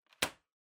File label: PC Hardware Lid Close